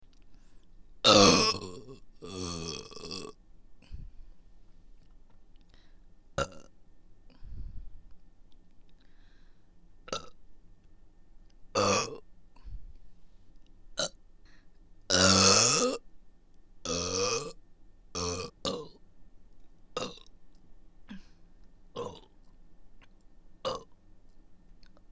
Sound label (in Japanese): ゲップのみ; ザーメン飲み音声